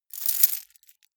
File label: key ring large rattle air hard